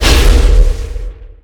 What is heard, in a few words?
Freeze Gun Shot; Weapons